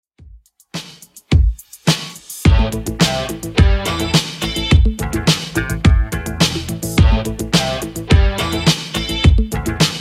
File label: 80s Redrum 106bpm